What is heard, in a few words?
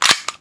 9 mm clip pickup